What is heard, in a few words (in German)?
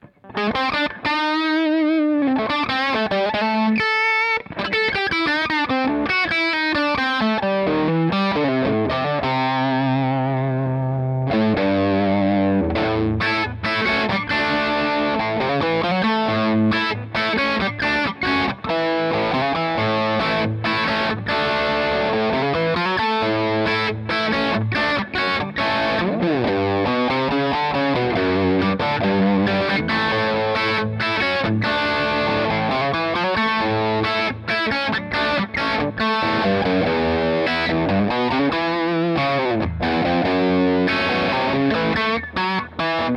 Blues Junior, Huber Mod, Les Paul Bridge PU, fat und boost an, Marshall Option